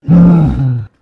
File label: Ogre Die